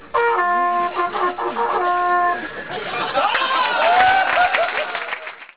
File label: back horn